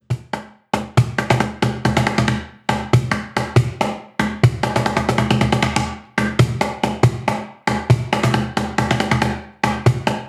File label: Reverse Woodpecker; Drumtastic vol II